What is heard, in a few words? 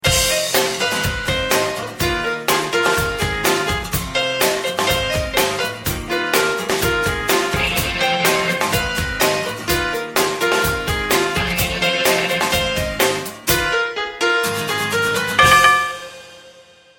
Improvisation